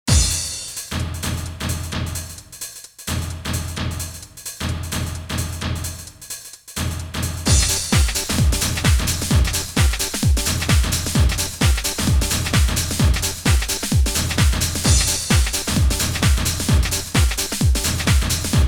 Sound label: Dance Trax GUITERISE; Scitron Spring Fair 97